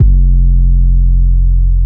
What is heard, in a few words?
808 F; Hood